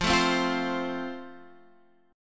F Major Guitar Half Step Down, chord

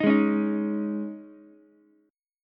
C sharp Suspended 2nd, C sharp, Ukulele; C#sus2; strummed; JGuitar

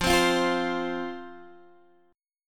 F 5th F, down, Guitar Open D

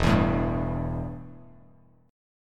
E Minor Double Flat 5th E, Bass Standard

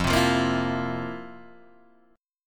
F Major 7th Suspended 4th, Guitar, Open G

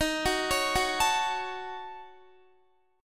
D sharp Minor 7th Flat 5th D sharp, down, Guitar; D#m7b5; arpeggiated; 4E,4A,5D,5G,5C,6F; chord shape

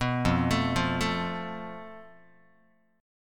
F Major Flat 5th Guitar, chord; FMb5; 2,1,0,3,1,x; 4A,4E,5A,5D,5G#,6C#; arpeggiated

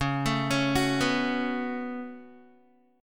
Db 7th Suspended 4th Sharp 5th Db down Guitar; Db7sus4#5 arpeggiated; tuning=4E,4A,5D,5G,5C,6F

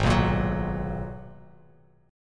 Ab Minor 9th down Bass Standard; strummed; JGuitar

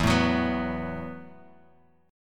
E Suspended 2nd Suspended 4th E, Bass Standard; strummed; JGuitar

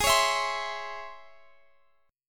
Db Minor Major 7th Ab

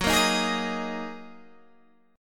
Gb 6th Add 9th Gb down Guitar Open G

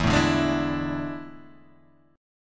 A Major 7th Suspended 4th E

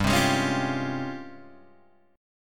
G sharp Major 13th

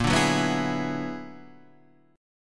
B-Minor Major 13th

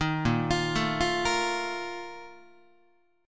Bb Major 7th Suspended 4th, Guitar; arpeggiated

